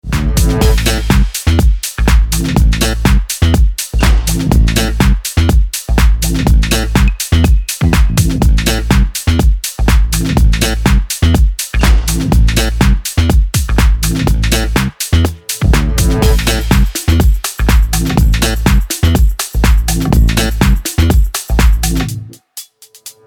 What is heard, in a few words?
Classic Club Mix Instrumental